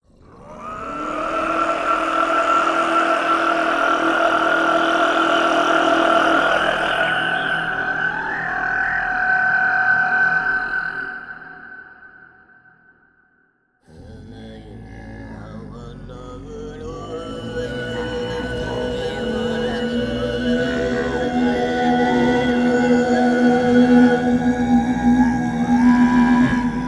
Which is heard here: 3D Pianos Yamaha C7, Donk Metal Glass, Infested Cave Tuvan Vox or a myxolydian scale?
Infested Cave Tuvan Vox